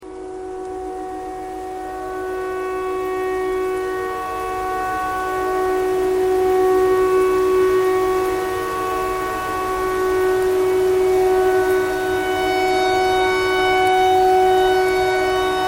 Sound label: tornado siren tornado siren thread simple